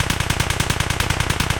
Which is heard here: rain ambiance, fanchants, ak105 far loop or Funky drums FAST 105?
ak105 far loop